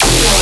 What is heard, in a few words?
Bass Hit E, Dubstep; Shine Bass; Ghosthack; AC2024 - Day 13 - Dubstep Sounds 2025